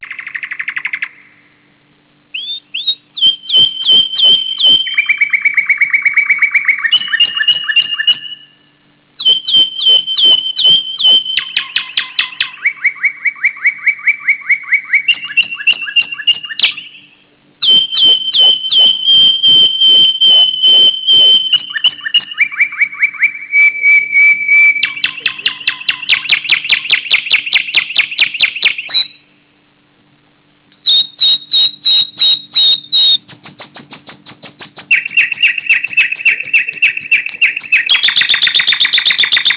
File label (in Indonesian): Suara Kicau burung; ringtone